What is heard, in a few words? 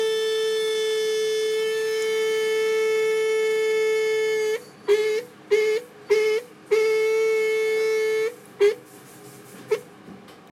Vòi nước bị hở Screaming faucet